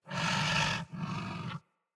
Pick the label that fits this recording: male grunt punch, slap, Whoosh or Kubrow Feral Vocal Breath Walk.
Kubrow Feral Vocal Breath Walk